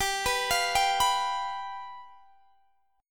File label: G 7th G down Guitar Standard; G7 arpeggiated; JGuitar